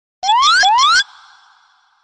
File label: Collect Mine Eggs; Banjo-Tooie